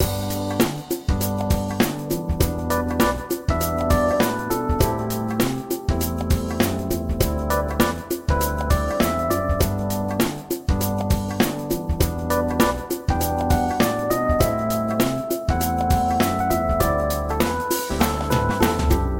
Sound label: Upbeat Theme Loop